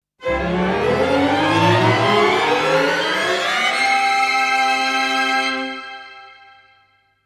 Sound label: Magical Effect; Ren & Stimpy